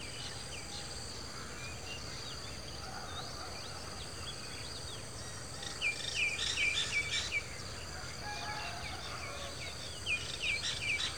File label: village; firefight